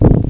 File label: STOMP; Tetris Collection for Windows 98